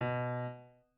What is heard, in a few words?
piano